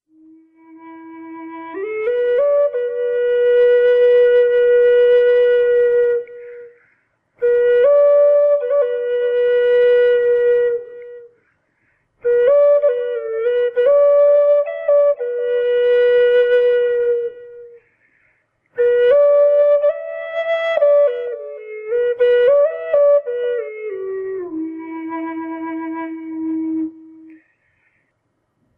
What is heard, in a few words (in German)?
Drone Buch E 432 Hz